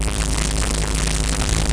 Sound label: electrical bzzt; starsiege